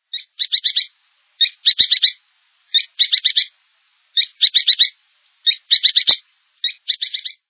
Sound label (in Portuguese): joao tenenem